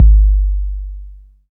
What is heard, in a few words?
wet kickdrum sample g key